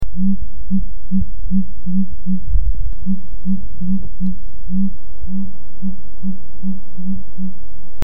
sooty grouse call hoots

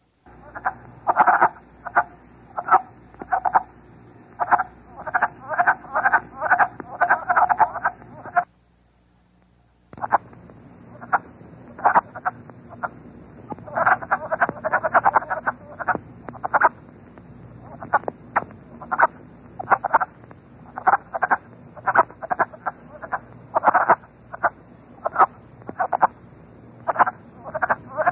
wood frog calling; citizen science

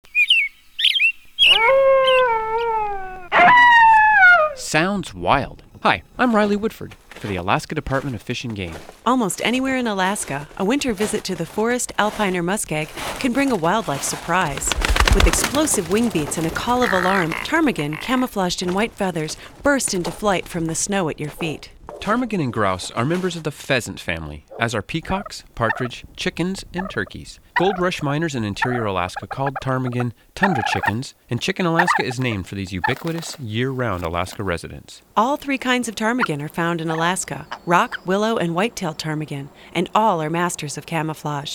alaskas exploding chickens

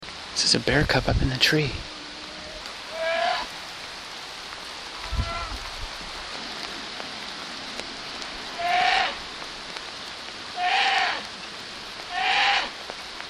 scared bear cub in tree

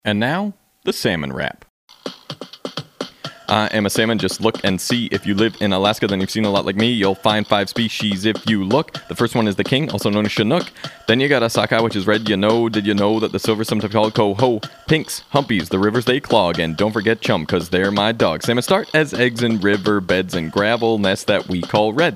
salsa y; Kenai Watershed Forum

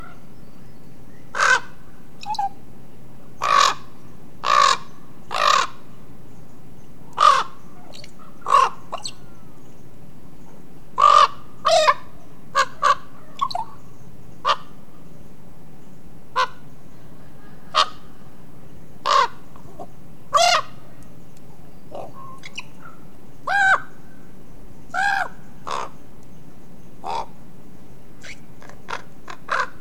common raven

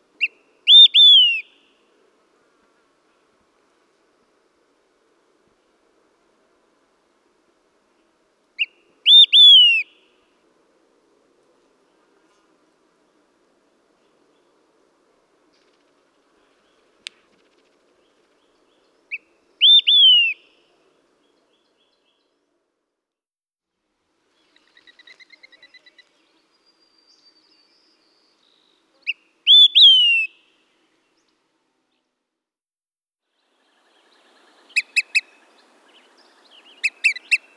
olive sided flycatcher; citizen science

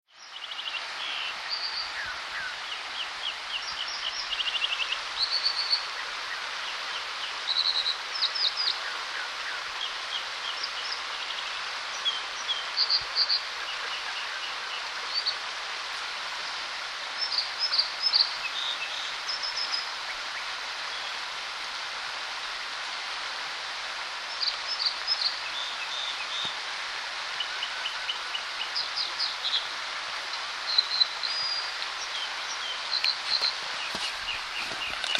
Dippers Berners River, american dipper

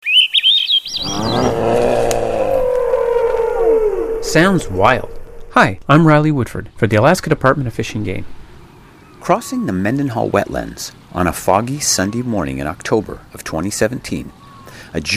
snowy owl

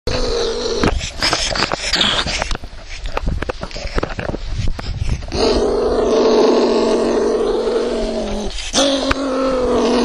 american marten

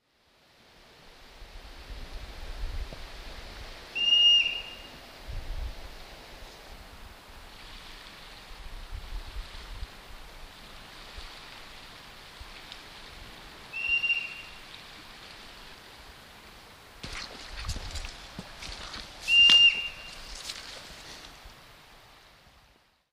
hoary marmot; Bridget Cove

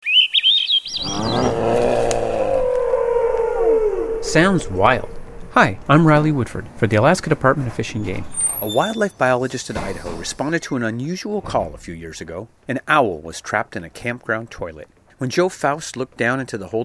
birds and pipes

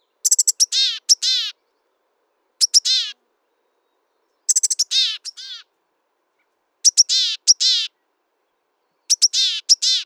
gray headed chickadee

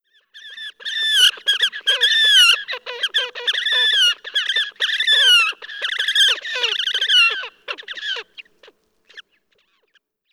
horned grebe; citizen science

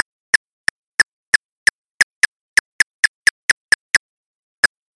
silver haired bat; citizen science

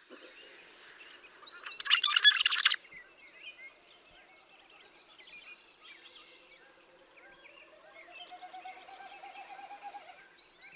rusty blackbird; Birds 'n' Bogs; citizen science

